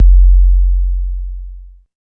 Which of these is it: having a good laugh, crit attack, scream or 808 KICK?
808 KICK